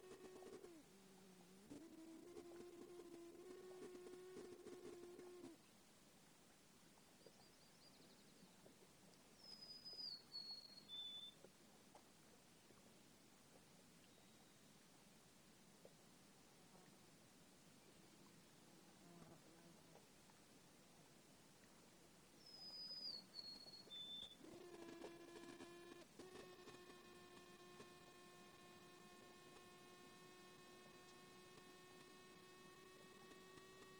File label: Insect Wingbeats Rising in Pitch; INSECTS; Alaska